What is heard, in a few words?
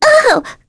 Vox Down; Mirianne; kingsraid; voices heroes en